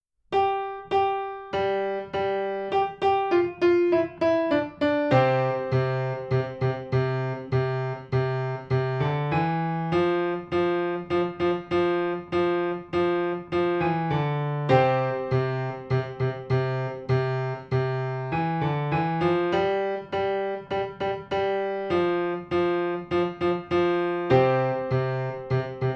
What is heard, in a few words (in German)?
Klaviertasten Rock